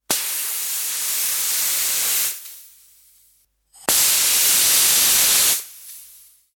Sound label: Air Escape Hiss, Air Escape - Puncture type hiss - short x2; Hisses; Objects; Tom Hutchings